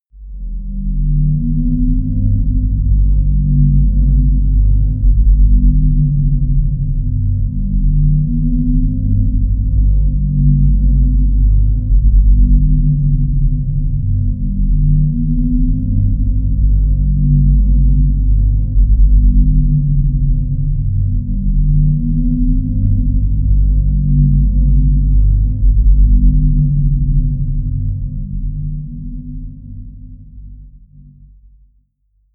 drone swirling bass

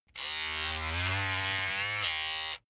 razor on load short; maschinen; rasierer

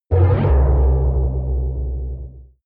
protective shield hit absorption short, energie

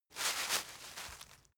rustle foliage short; berufe; foerster

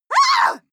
female shout shocked angry; schreie; menschen

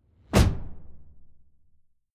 sci-fi shot soft

